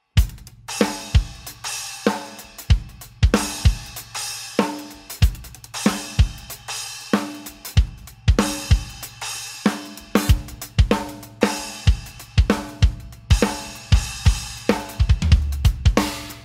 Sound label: MEINL